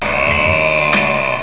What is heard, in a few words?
ahhhh